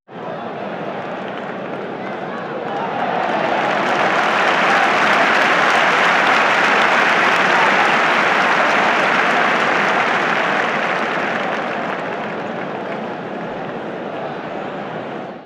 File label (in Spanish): ovación; ruidos